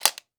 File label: Weapon Gun Machine Gun 9mm Clip Lock; AdobeCC